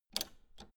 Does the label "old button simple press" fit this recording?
Yes